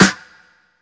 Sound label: ambient steel snare drum g key; snare drum samples